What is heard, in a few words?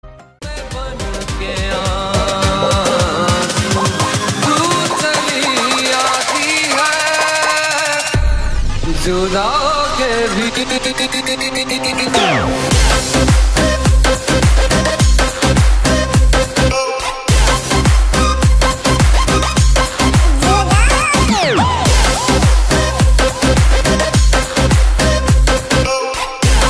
trap mix